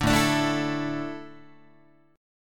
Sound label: A Suspended 4th, Guitar; Asus4 strummed; Asus4 chord; JGuitar